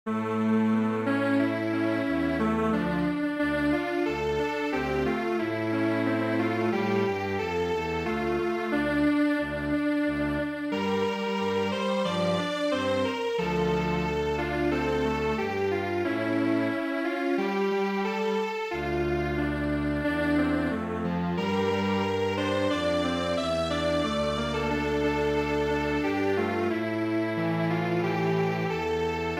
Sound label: Strings Quartet; midi; traditional